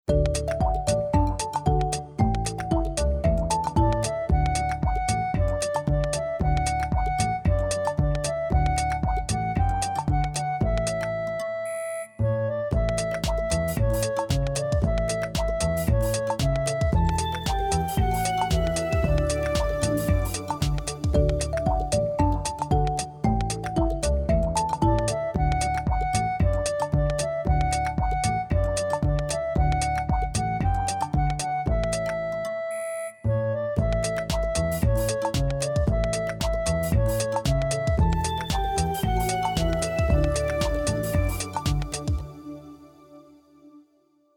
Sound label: Melody